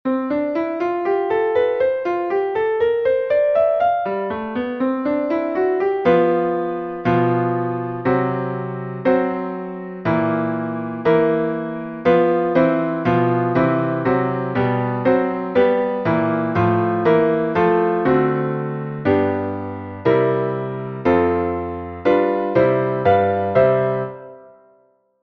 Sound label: Intervals The Tritone; Intervals 4 - The Tritone; Intervals Packet 1